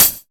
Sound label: HIHAT; E-Lab; X Static Goldmine 2